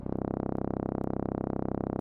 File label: Instrument